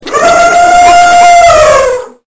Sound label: elephant